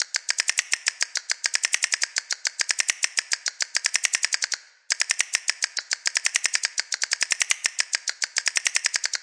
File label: 104 Found Percussion; 074 to 128 bpm